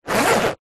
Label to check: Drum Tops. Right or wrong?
Wrong